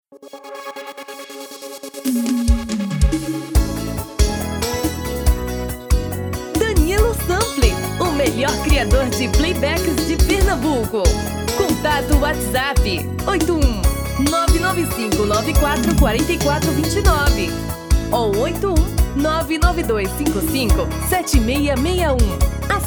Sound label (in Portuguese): BREGA